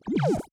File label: sine wobble, bass